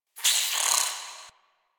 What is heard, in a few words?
Interior Hiss; Warframe; Infested Eris; Misc Indoor